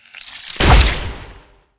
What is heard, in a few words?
beetle atk, monster